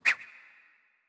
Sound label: Card Close; Se Structure Card Close